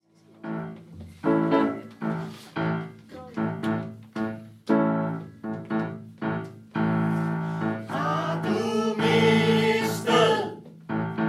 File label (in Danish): Sirenesangen